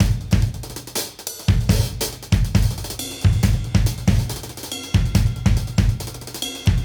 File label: Modern Breaks; Touch Loops; ClassicBreakSessions